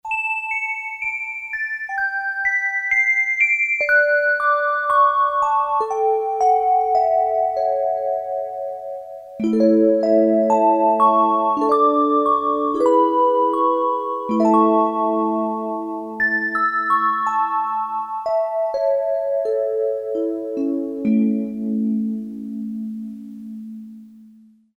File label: Bells; KSDU-013 Combined Groups